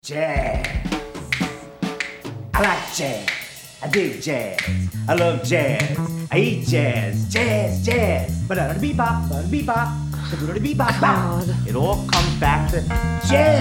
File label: Jazz Baby